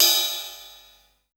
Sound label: Ride Soft, classic machines dx